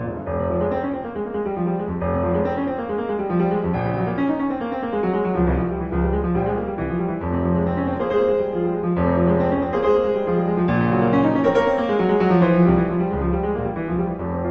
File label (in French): sonate pour piano, vivace; BEETHOVEN; op37 (appassionata)